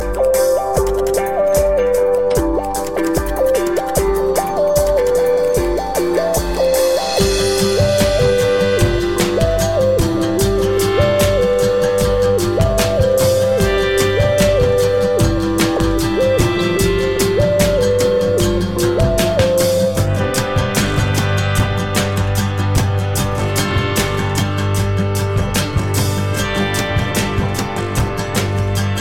mac os tiger intro

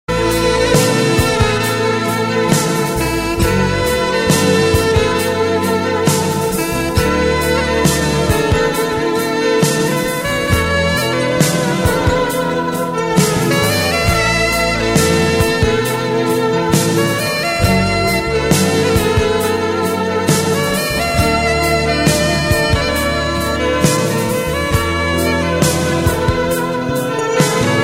romantic theme tone; rock

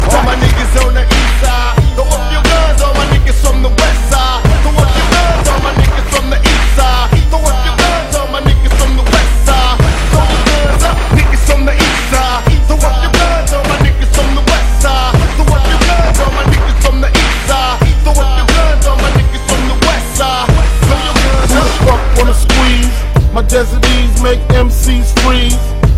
Throwing Up

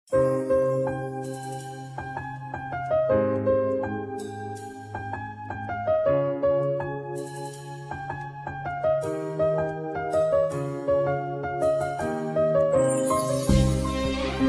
arabic love tone; hip hop

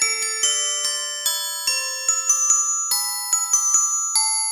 soft bell

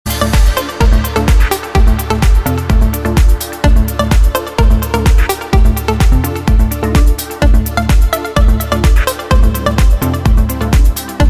party music

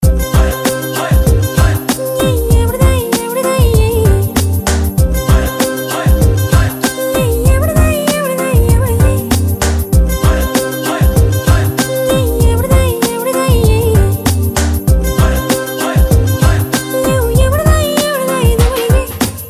ringtone

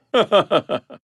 Laughter

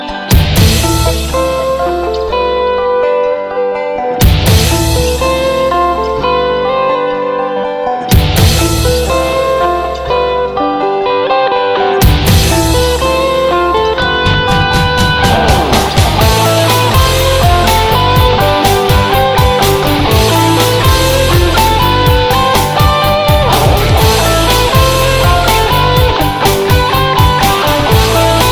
just rock

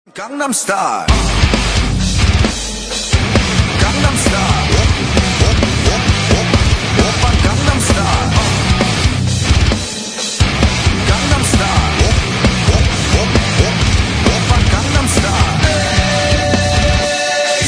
metal remix

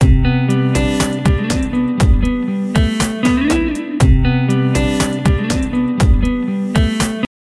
Remix Ring

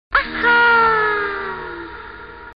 Ahhaaa Ahhaa

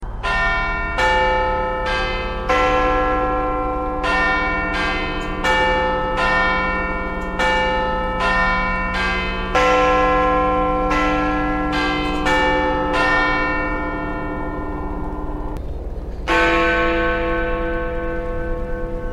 Big church bell

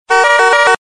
car sound; ringtones

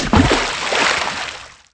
Splash Sound; Sega

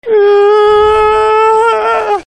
Scream

